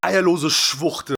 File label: hurt; spackenmobs; apored